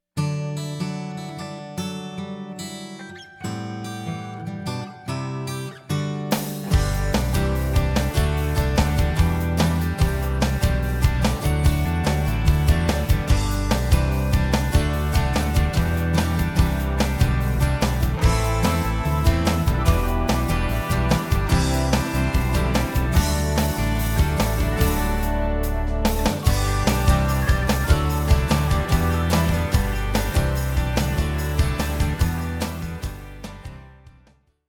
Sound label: Feel Good Rock no Vox